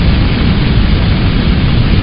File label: fire loop